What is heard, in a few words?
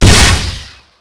Launch; Quantum Redshift